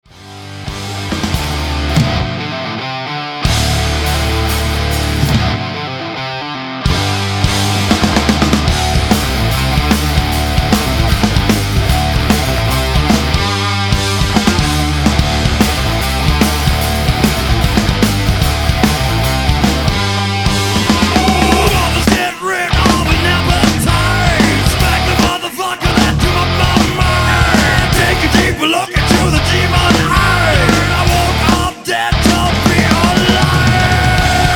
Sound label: heavy-rock, hard rock